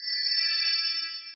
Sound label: fly